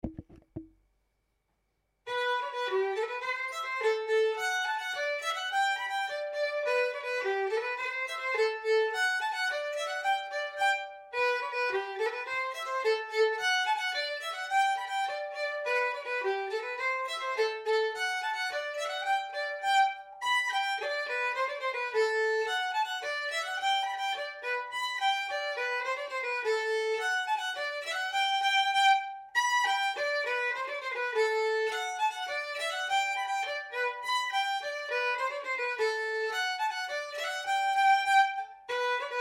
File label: Polka; eft Olle på Halla